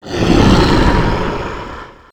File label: golem breath